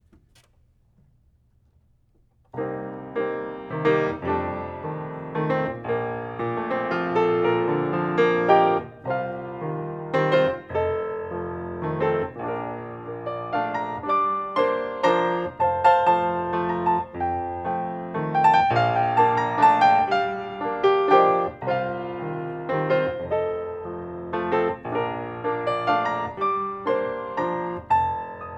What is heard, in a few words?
Bb waltz slower; Bayadere